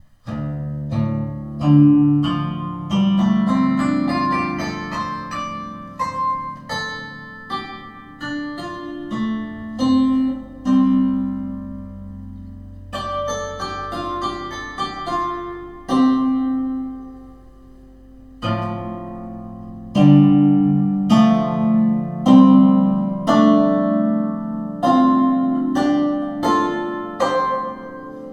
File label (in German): Pentatonik Anhemitonisch